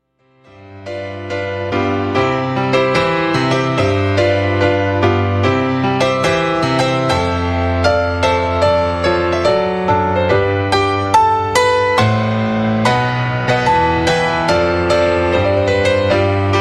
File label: Wedding March piano